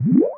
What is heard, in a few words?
Bubble; Buggy; PS1